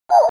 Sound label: crossbills toop